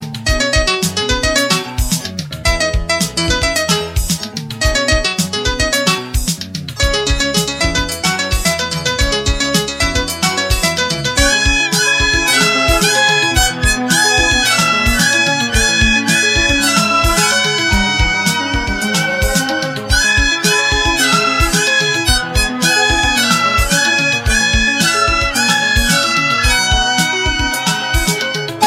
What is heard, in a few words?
light tone